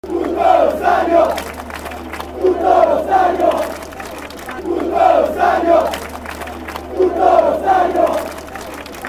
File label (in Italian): Tutto Lo Stadio, cori curva nord